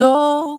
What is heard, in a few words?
Noises Vocal Shot; Synthetic's Serum Collection; Production Master - Vital Future Bass 4